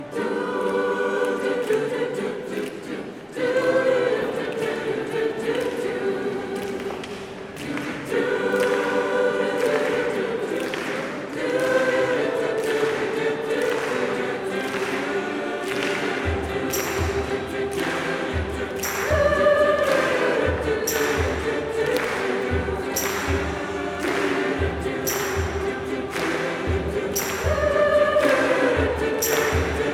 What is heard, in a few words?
siyahamba we are marching